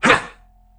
throw; midnight guns; bootsector male 00

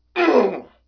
fall crater alt; bootsector male; midnight guns; bootsector male 00

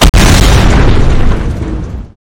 explode; midnight guns

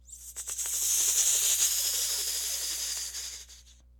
idle, snake; midnight guns

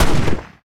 ak47 fire; midnight guns

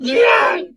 death, male; bootsector male; midnight guns; bootsector male 00

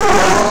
pain, player; midnight guns

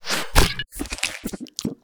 calcium shot, food; midnight guns

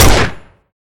fire ppk, pistol; midnight guns

